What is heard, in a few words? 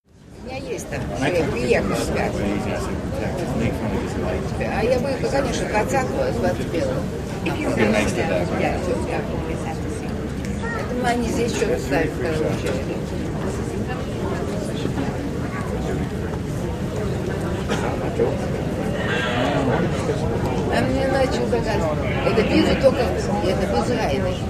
New York Airport, int airport